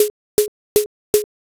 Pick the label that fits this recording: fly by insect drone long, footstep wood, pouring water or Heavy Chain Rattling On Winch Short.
footstep wood